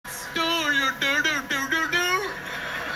hhhhhhh

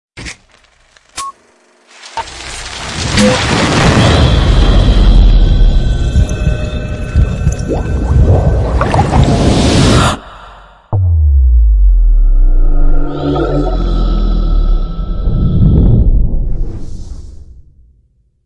dolby digital plus countdown

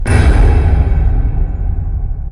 breaking point sound

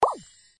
short twinkle; animal jam

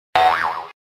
boing

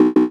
error notification

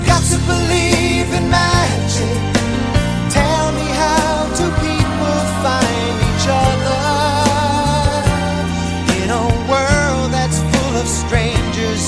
chorus

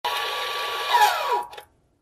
tesla off

